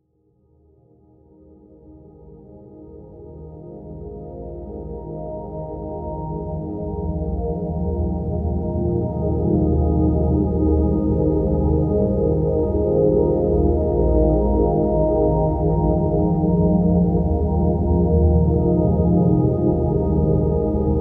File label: Village Rain